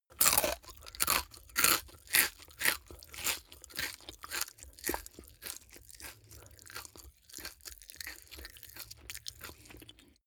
eat crisp mouth open

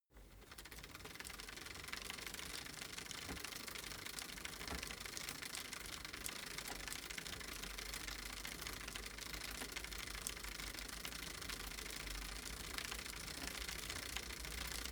bicycle street drive

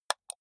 computer mouse left click single hard

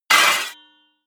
hit metal sword large

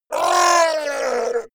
fishman shout pain

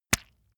leather impact mud hard squelch

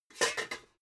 pots clank soft

